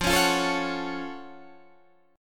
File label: Gb Minor Major 7th F